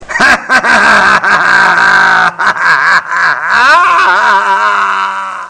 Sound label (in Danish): sjove grin politimand